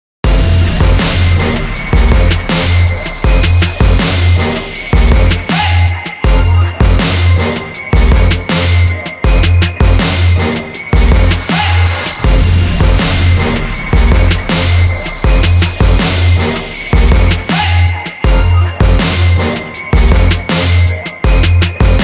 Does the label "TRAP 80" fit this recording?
Yes